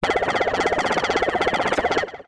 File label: Synth Mono